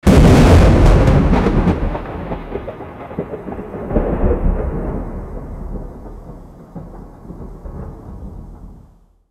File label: cracking thunderclaps; pikachu